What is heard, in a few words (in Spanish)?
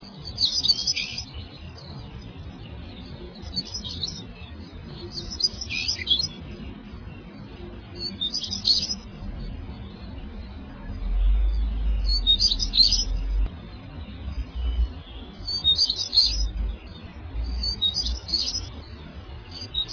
tarabilla